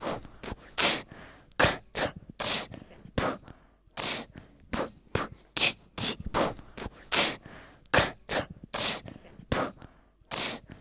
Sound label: loop